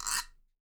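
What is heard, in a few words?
guiro, Percussion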